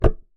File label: Key Noise, Melodica